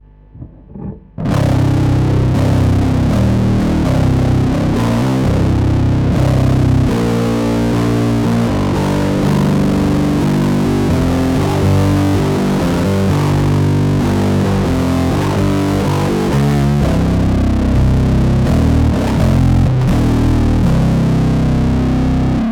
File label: muff mosfet; diy